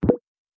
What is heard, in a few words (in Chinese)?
按钮; 欢乐麻将